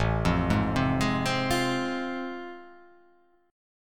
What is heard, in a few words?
A Minor Major 7th Sharp 5th